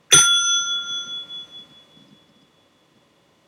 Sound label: Bell